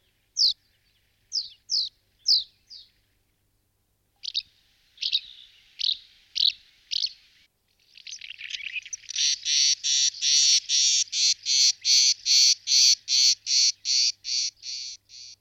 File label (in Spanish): Avion comun